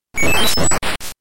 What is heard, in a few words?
Leaving Planet